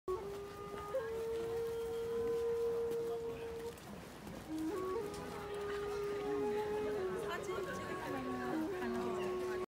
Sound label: Shakuhachi; فلوت ژاپنی